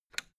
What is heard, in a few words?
fence gate latch rattle single; objekte; tueren